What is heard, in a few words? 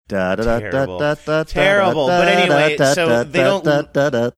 singing melody to a song